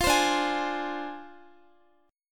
D-Minor 7th Flat 5th, D, Guitar, 4E, 4A, 5D, 5G, 5C, 6F; strummed; x,x,15,17,14,15